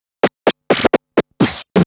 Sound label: Scratch Fills 140BPM